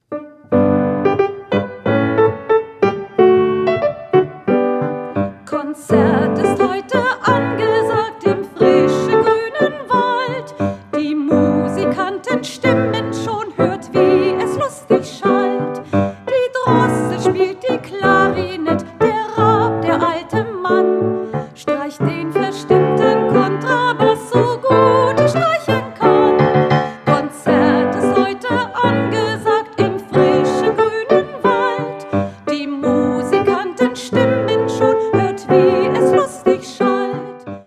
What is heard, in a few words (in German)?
Wald 1. Stimme 1. Strophe mit Gesang